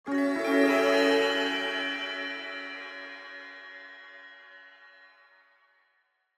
Guitar Atmospheric Element High, Cinematic Guitars; MatiasMacSD; AXmos